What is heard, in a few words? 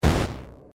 die